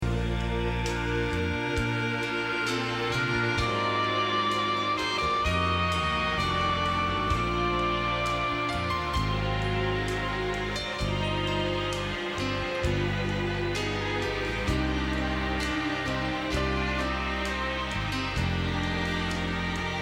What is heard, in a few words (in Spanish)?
Bajo